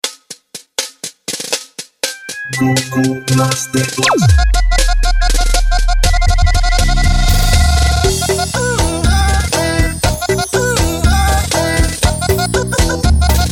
Swing Novo

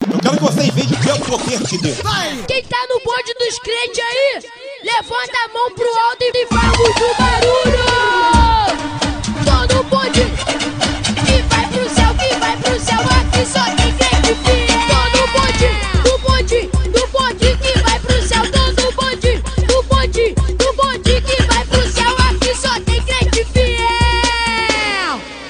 funk gospel

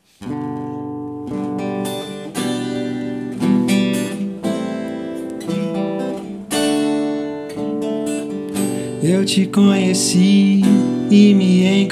Voz E Violão

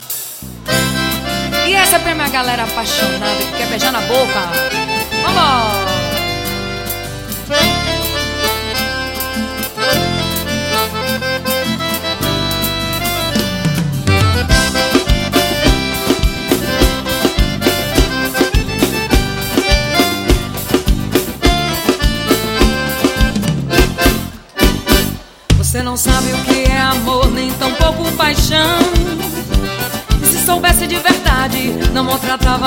forro

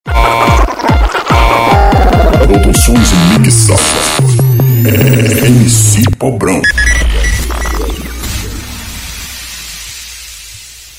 vinheta som automotivo; cgmrproducoes

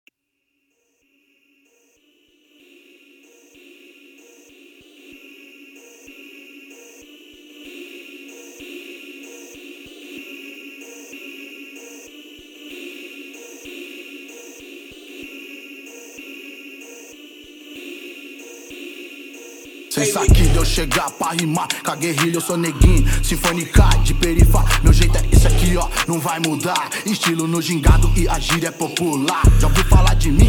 rap

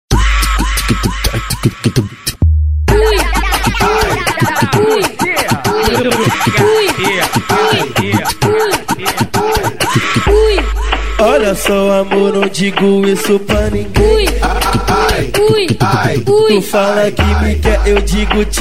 funk

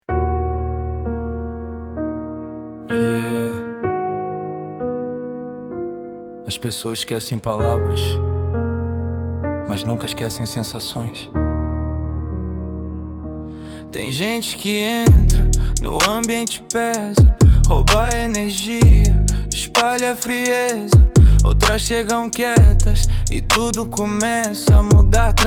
atmosfera

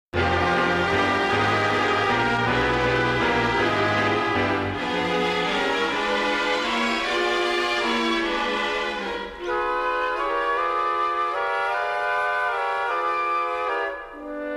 beat

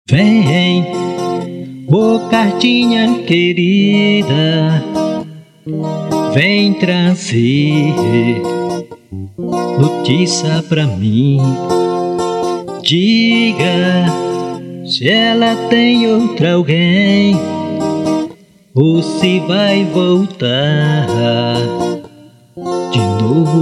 cartinha voz e violao

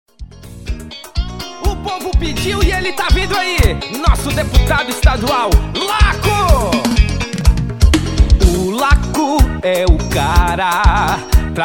Paródia Jingle Deputado

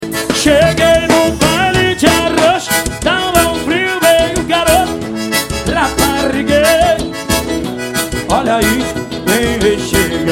Dance & Balance Forró, Forró Xote; manaus am

